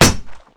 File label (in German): block schild; mount blade